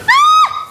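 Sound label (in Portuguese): grito de pavor, scream of terror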